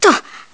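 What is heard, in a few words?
Wobble Ice, gameplay sounds; Link; zelda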